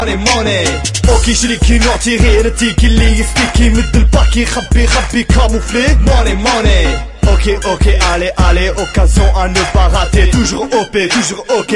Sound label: Dz Rap